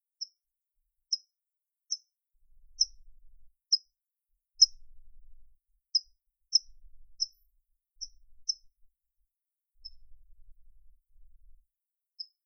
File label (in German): Zwergfledermaus Ultraschallortungslaute; Zwergfledermaus, einer muss der kleinste sein; Mit einer Größe von 4,5 Zentimetern im ausgewachsenen Zustand zählt die Zwergfledermaus zu den kleinsten Vertretern der Säugetiere